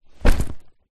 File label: foley bag rucksack set down carpet